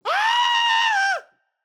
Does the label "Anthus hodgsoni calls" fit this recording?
No